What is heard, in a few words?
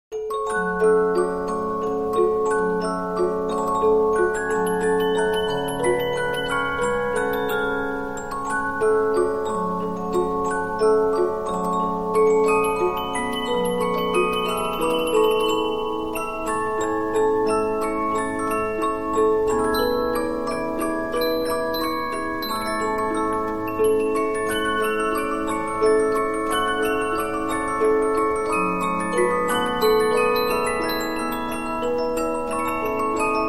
Polyphon, 15inch disc musicbox